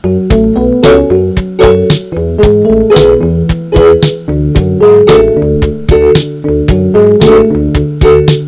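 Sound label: steel drum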